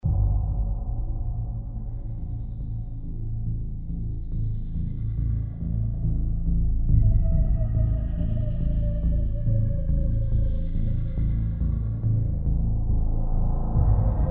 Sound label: Chase Ambience; 3008